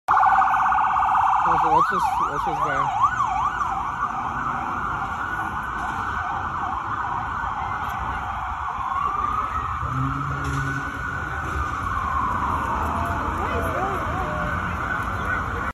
sirena policii

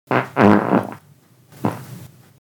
nemnogo drugoj perdezh

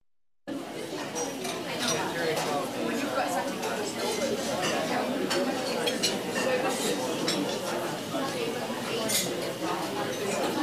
shum restorana obshaya atmosfera golosa posetitelej zvon posudi

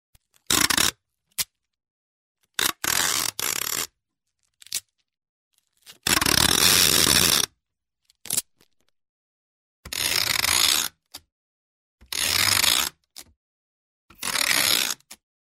derzhatel dlya skotcha nalozhenie lenti na korobku

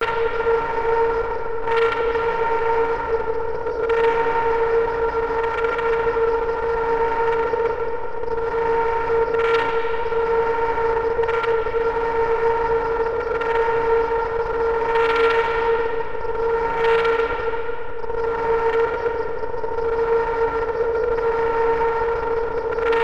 kosmicheskij signal trevogi

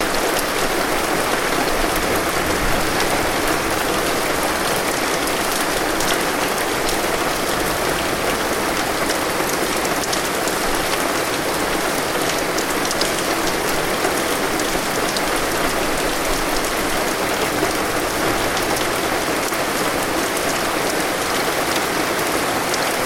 liven na ulice

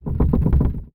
korotkij otdalyonnij zvuk prigayushego basketbolnogo myacha po rejkam derevyannim

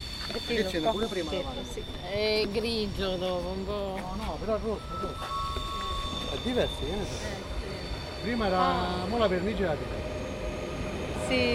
skorostnoj poezd frecciargento etr 700 pribitie na stanciyu; san benedetto del tronto

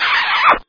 poceluj visokij vzasos bistrij

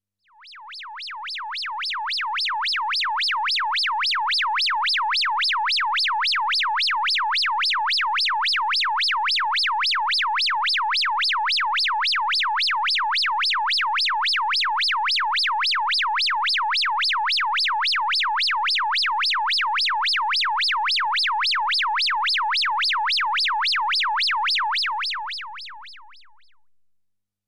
elektronnie zvuki sirena

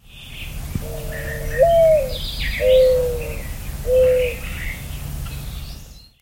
zvuki golubya s drugimi pticami v parke

